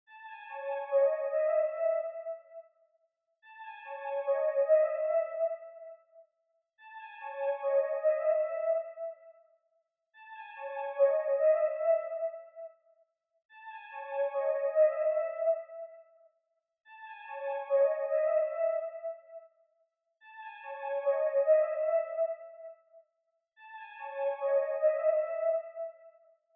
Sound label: 143 BPM C# Min Synth, Drill; Cymatics; Nothing Left